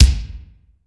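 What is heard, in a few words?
bass drum, effected drum; 90 sSampleCDs; Crypton - DrumBase5000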